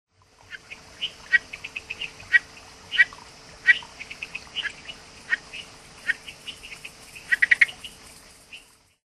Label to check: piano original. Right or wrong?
Wrong